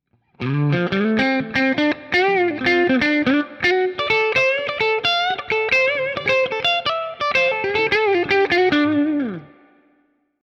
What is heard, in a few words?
Cort SP90 Solo